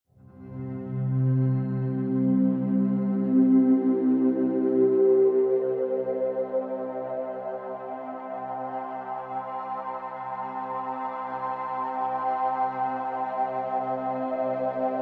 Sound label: Sweeper Swells